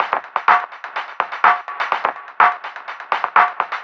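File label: Bandpassed, percussion; перкуссия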